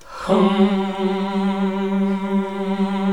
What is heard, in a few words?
HMM G1, classic vox